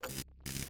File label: win display